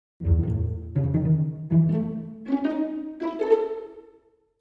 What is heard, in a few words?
pizzicato phrase